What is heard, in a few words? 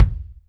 Kick, Alesis DM5